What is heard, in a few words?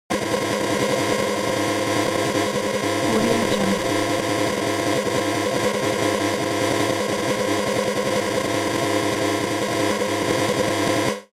abstract noise glitch electronics long